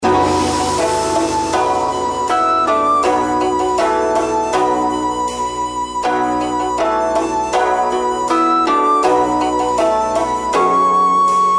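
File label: reel spin background, journey to west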